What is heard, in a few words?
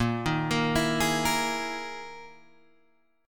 E Major 7th Flat 5th chord